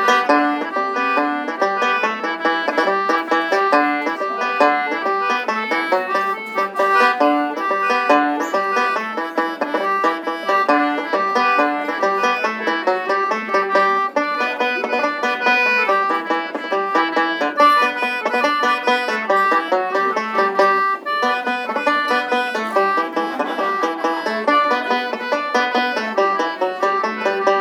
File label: Polka